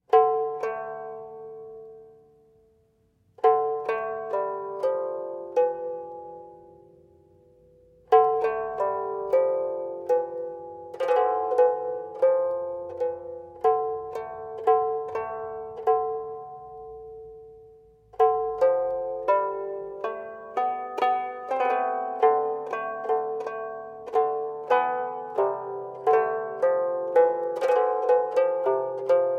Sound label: traditional Norse; St Olavs kappsigling